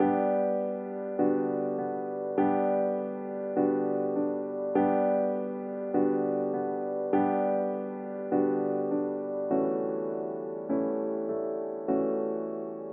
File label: piano lesson